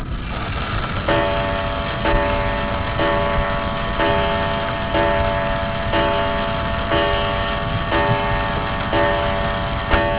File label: clock